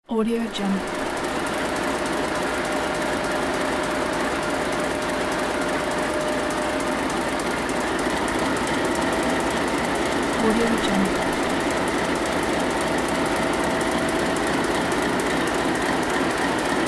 diesel engine; diesel engine mega pack

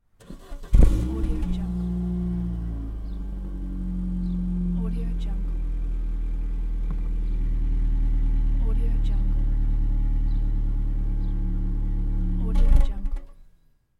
old petrol engine car

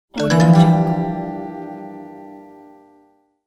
antic game ding

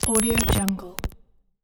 glitch

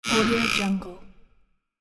creaking door; erensound

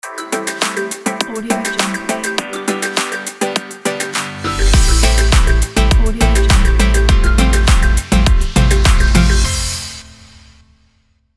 pop dance positive logo

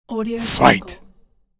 fight

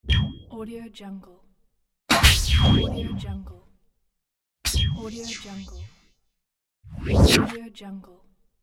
sci fi interface

